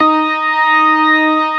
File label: STOPS, ORGAN; Keith Emerson Signature Series